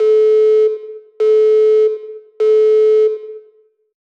School Fire Alarm

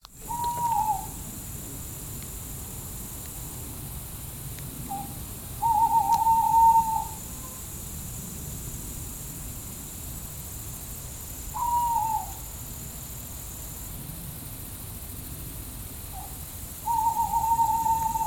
Tawny Owl Hooting

